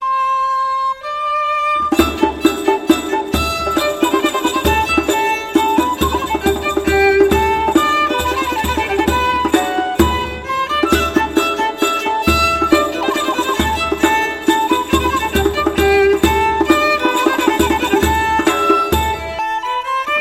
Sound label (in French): sonnerie saltarelle; troubadour